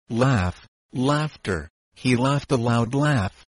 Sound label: laugh